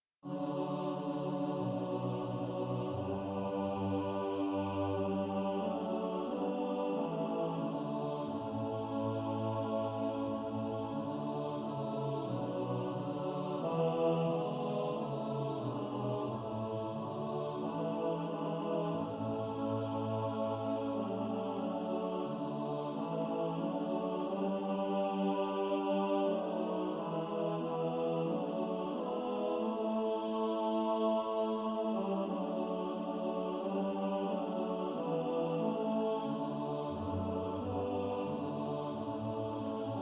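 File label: bass, practice music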